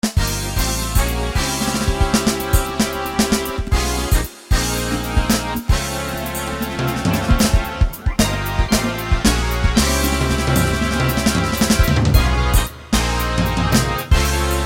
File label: fusion